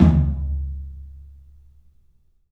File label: TOM, 3-D Percussives; E-MU Producer Series Vol. 5 – 3-D Audio Collection; P.A. Kit